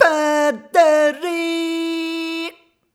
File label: Rhythmic A